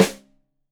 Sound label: Rim Shot Stereo, drums